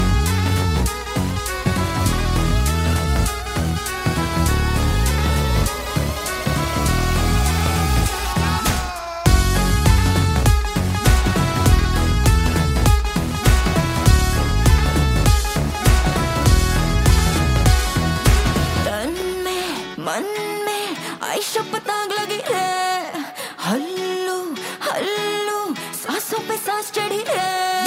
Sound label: remix music ringtone, bollywood ringtones